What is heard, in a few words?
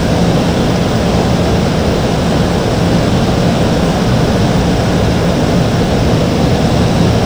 Aircrafts Engines IL76 Back Engine; DCS